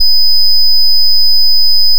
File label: additive voice single; FFTimbre